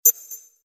Beeps Bonks Boinks; Infernal Radiation

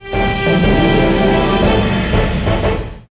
FANFARE; Dungeon Defenders Eternity

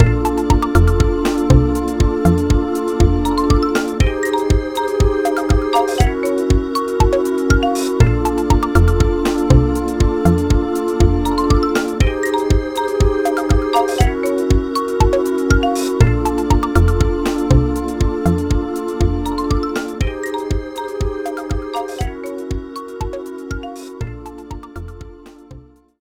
BGM Balloon; Baloon; Irodzuki Tingle no Koi no Balloon Trip; NDS

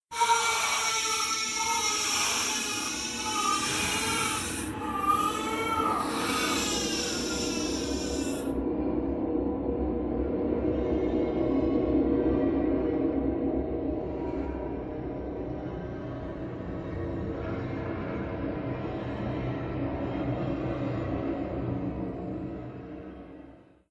Baby Toilet Jumpscare; Don't Be Afraid The First Toy

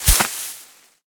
Palm Leaves; Angry Birds

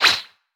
Join Hit; Boomerang Fu

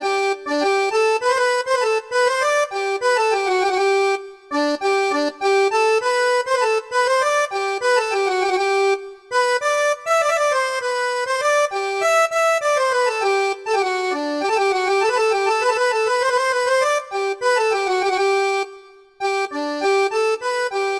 Town Tavern

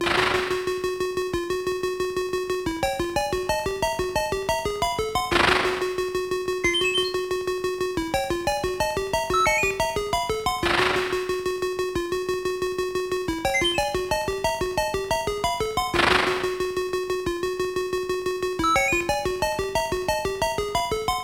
stronghold bgm; Capcom Game Music Volume 1

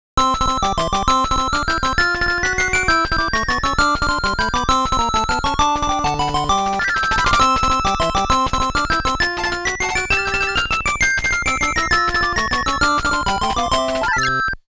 tiny fanfare; hucard disc in taito vol. 2

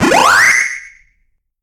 Ghost Eat; Pac-Man Championship Edition 2 Plus

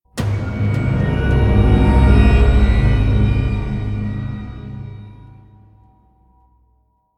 UI Stinger Complete; Starcraft 2 Wings of Liberty

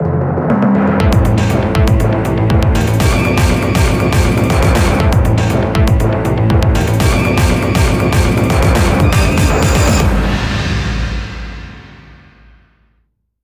Jingle Huge Appear

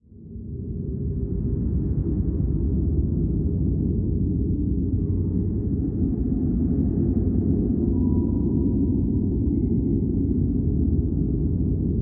Booom; Pokemon Mystery Dungeon Rescue Team DX

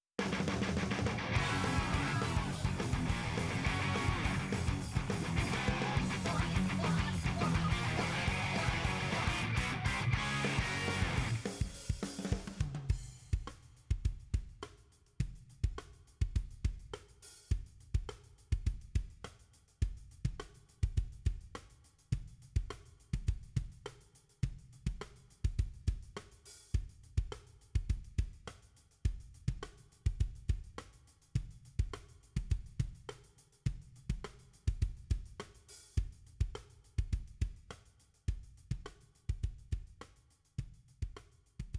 Multi BGM Versus Result Win

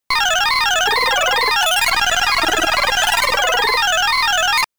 Creature Caught; Make Trax; Arcade

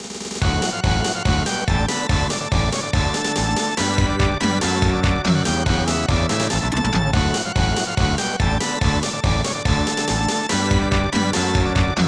Baseball

